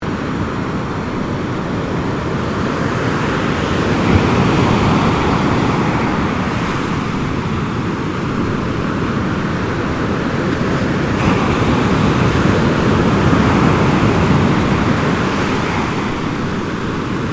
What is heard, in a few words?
Water Waves Beach Medium Close Loop, Medium waves on beach at Lakes Entrance; Ocean (Sound Effects:Nature)